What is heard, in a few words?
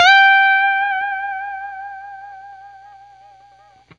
BLU BEND UP, Guitar; 90 s Sample CDs; Quantum Leap - Guitar & Bass